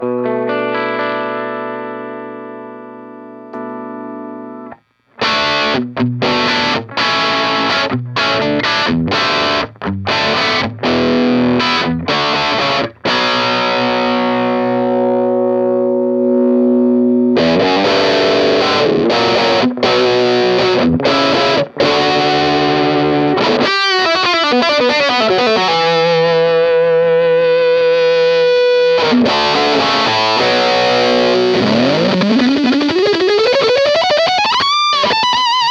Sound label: Super BA Distortion Knob Moves; MXR